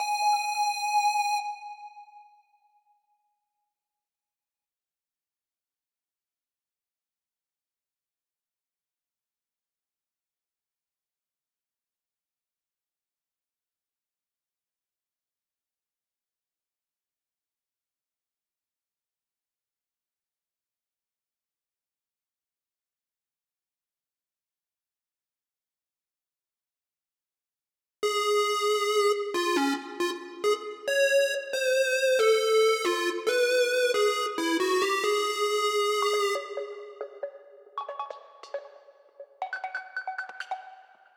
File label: Breakout Psychedelic Mix Stem Layer; Breakout Recharged